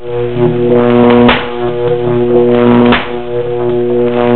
strom; puzzomania

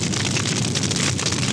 FEUER; DEMOUK

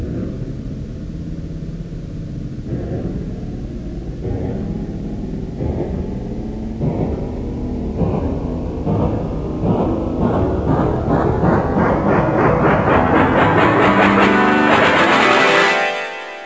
STAMPF

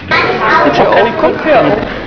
Kneipe

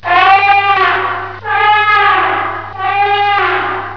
ELEFANT; 999wavs

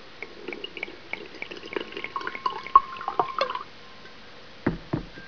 WEIN

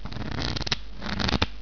MISCHEN

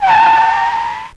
Bremsen

